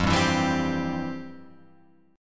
F Major 7th Suspended 2nd, F, down, Guitar, Open F; strummed; JGuitar